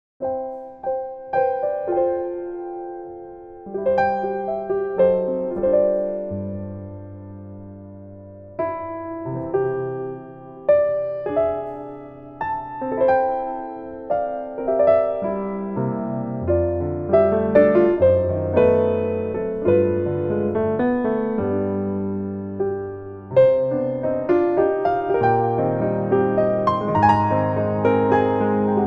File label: Sailing Away (Piano Version); Infinity Nikki; Infinity Nikki Game Original Soundtracks Vol.13